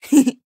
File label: Nonverbal Happy; Aurora